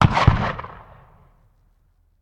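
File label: Cannon Black Powder; Sound Ideas; Guns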